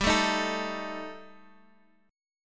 G Suspended 2nd Sharp 5th G, Guitar, Half Step Down